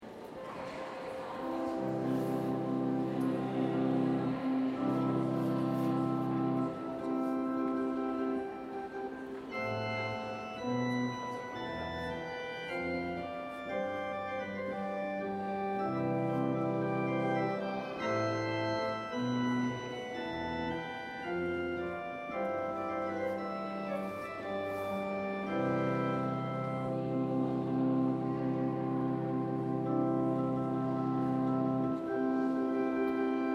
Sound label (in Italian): Ingresso Marcia Nuziale